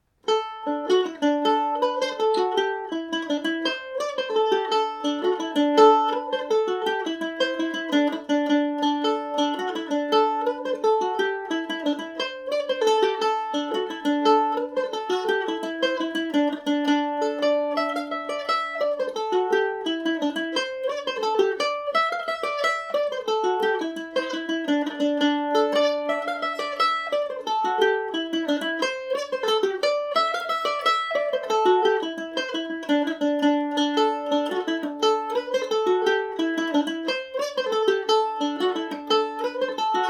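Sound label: D mixolydian, Irish mandolin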